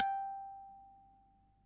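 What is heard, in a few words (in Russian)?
нота G4, сыгранная на калимбе, пианино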